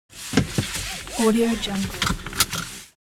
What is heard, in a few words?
seat belt